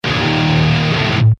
Heavy Msg

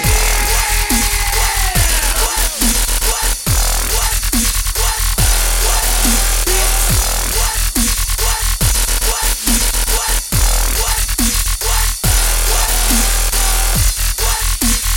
Crunk

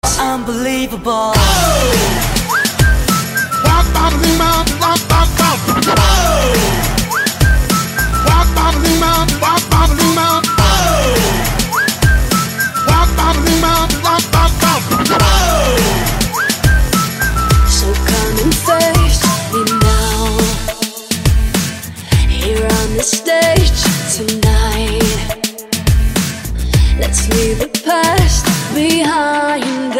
Unbelievable whistle

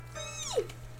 Excited Fox

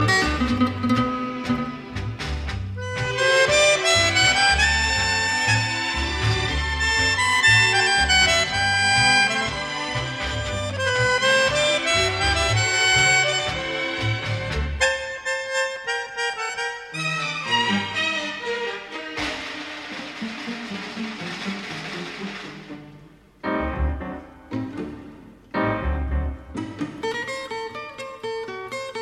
Tango

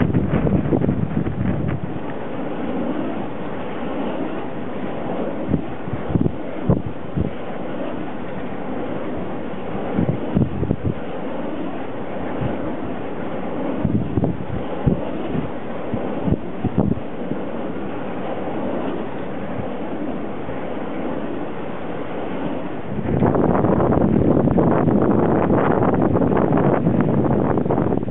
Wind

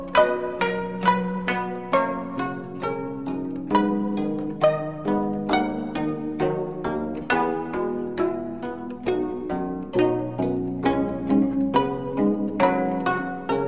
Canon In D Guzheng

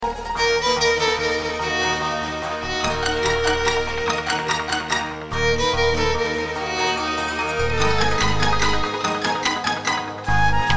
Soft Violin

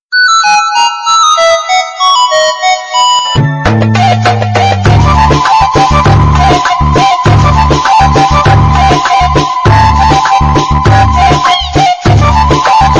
Nokia Arab

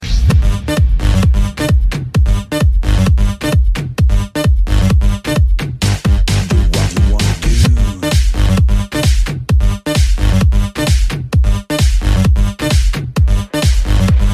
Tecktonik Remix

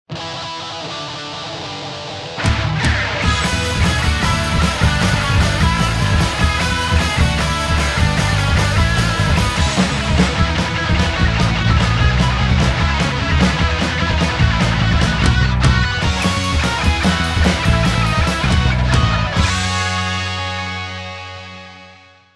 Punk

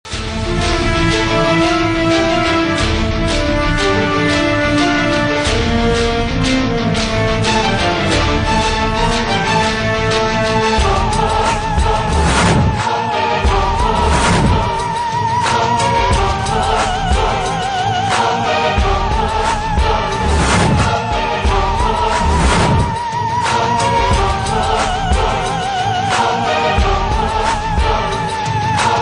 Horror; Abhay

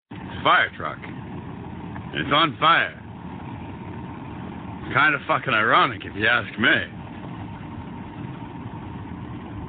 fire; Pembroke

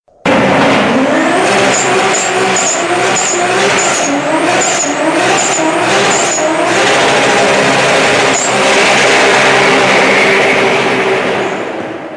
Toyota Supra Burnout

